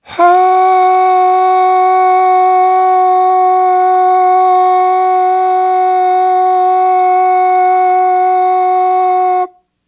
AHHH, Vocal; Polyend

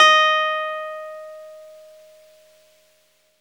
Clavinet EB5; Big Fish Audio - First Call Vintage Keyboards

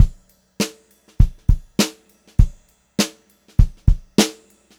VAR, Funk-Groove Drumloops

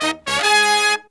Pop & Funk Brass

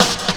LOOP L, Drum & Bass 160 BPM

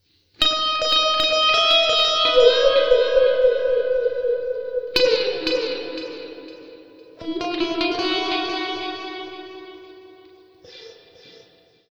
Gtr F#, Slo Mo Funk, Lounge; Zero-G Kocktail Kollection; Construction Kitz (Lounge)

